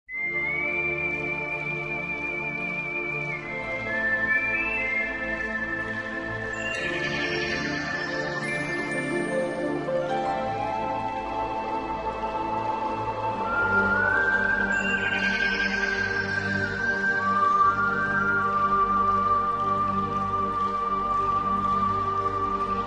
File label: Chinese forest music